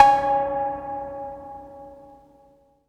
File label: COWBELL, 808; Drum Kit; Polyend; Stazma & Concrete Collage; Spring808